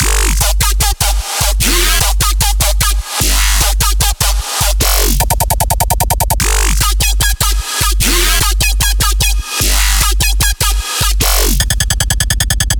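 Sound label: Drop Loop, 150 BPM G minor, Dubstep Bass Loops; Synths; Raptor; Cymatics; Dubstep Starter Pack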